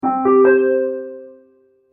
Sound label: notification